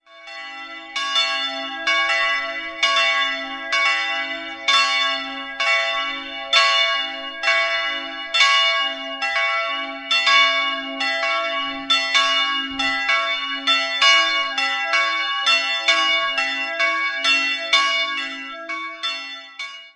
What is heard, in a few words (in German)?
Kelheim Affecking ev, Evangelische Markuskirche, Glocken; Kelheimer Stadtteil Affecking